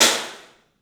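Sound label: CLUB CYMBALS; 3D Sound; 3D Drums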